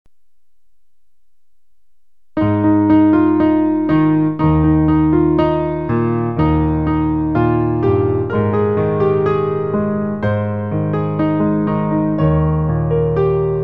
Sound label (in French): tous ensemble amis piano; recueil Louez Dieu